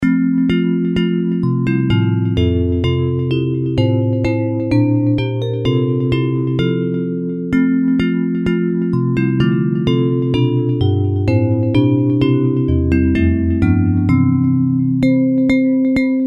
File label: Bells; Hymns